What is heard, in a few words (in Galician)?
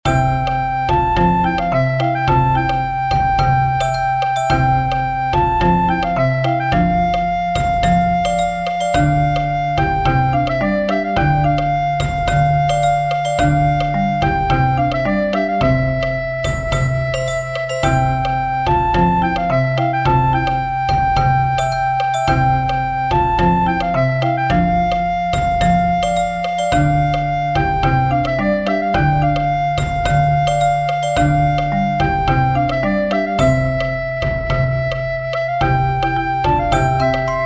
RUMBA Conxunto Instrumental